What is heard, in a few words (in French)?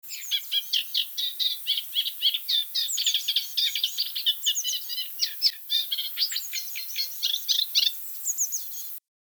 rousserolle effarvatte